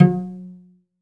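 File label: SOLO CELLO, Synclavier Strings; ILIO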